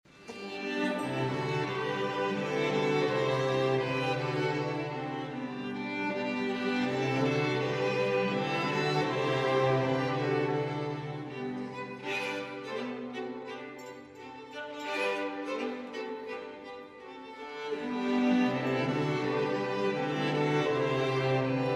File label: hled male jezulatko; Na štědrej den jsem koukal